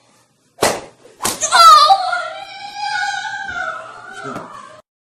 kid slapped; made with Voicemod

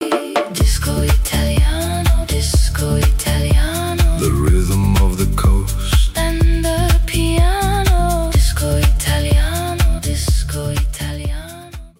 Disco Italiano

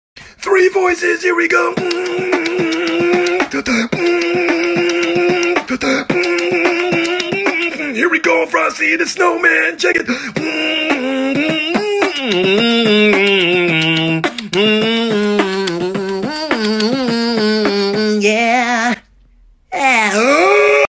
Old Man Transforms to Child While Beatboxing

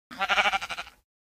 Sheep; Minecraft

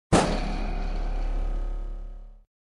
coil head spring

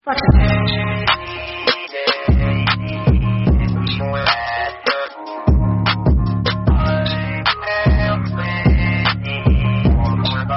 HipHop Trap Remix; GorillaTag

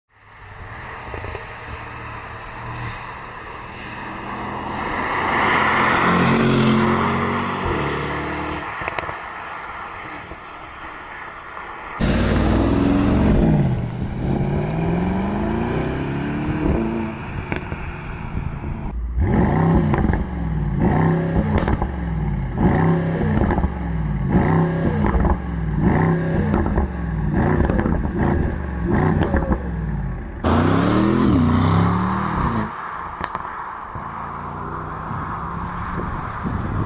AMG GT63 pure Sound 4L V8; gt63 amg amazing sound

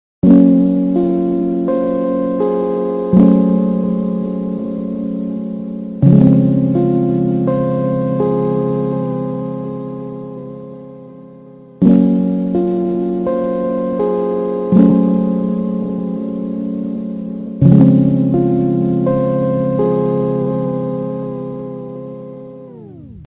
sad song loop; made with Voicemod

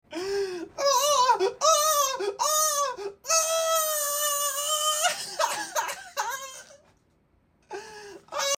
laugh; Yourrage

